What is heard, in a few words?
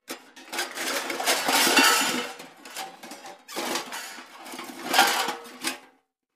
Junk Search Through J, junk crashes; Junk Search Through Junk Pile